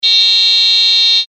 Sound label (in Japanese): クラクション重音単発